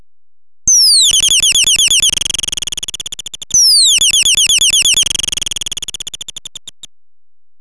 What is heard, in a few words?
bird